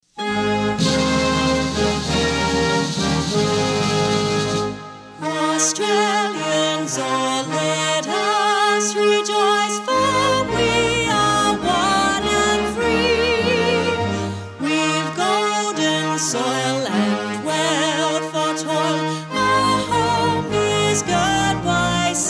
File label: anthem verses with intro and vocal